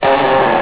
knarren

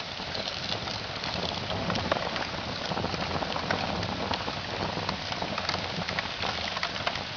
feuer; de leestore

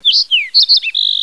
vogel

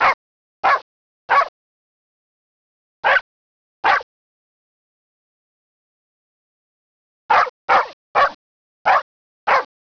hunde; de leestore

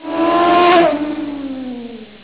auto

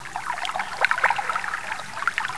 hoehle; de scheiterhaufen2

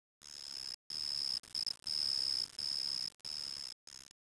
grillen; cs vicus

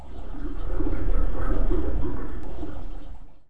Wasser; as runaway

